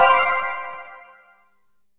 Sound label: status apply; WordJourney